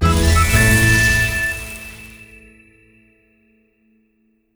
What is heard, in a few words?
medium Win Musical; netent